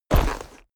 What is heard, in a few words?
horse step saddle